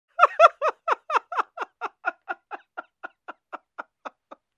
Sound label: Hilarious Laugh